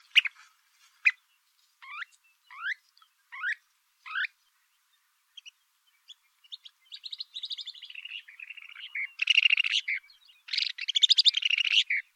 Canyon Wren

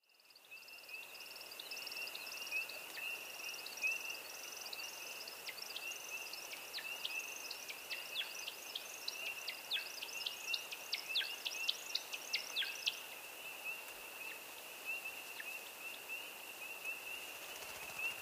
American Woodcock

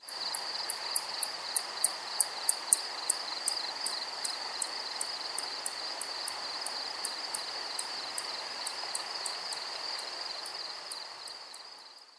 Spotted Bat

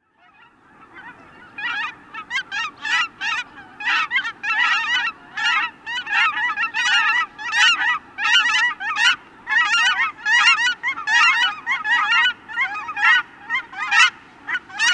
Cackling Goose

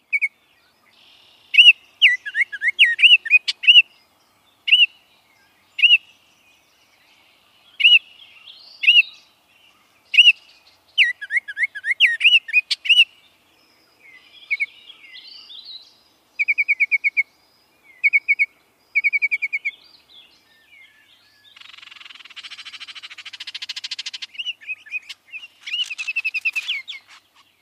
Baltimore Oriole

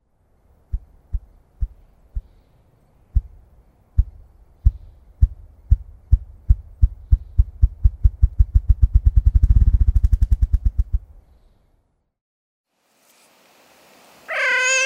Ruffed Grouse

